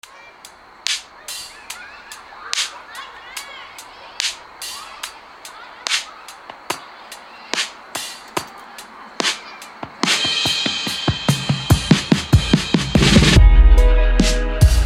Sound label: 72BPM RB Hip Hop